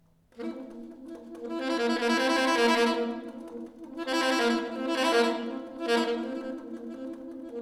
Saxophone Trilling With Dynamics

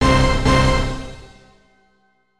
Double Orchestral Hits Note C

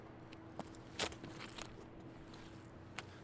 Fall Getup Foley

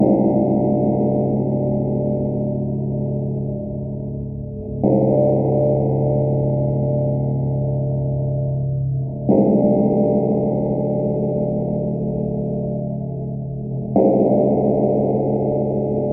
Doom Bell

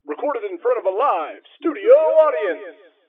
Live Studio Audience

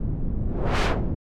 Short Woosh

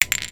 Lego Brick Fall

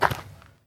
Dirt Gravel Footstep

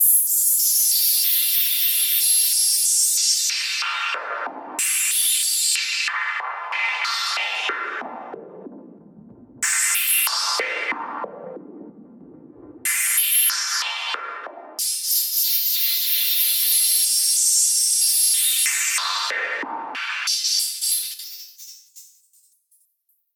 Shimmering Shavings

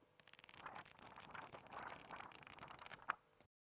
Page Flips In Thick Book

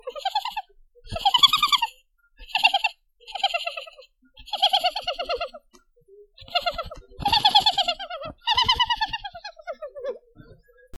Smiling

